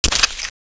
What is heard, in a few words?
skewer, ambience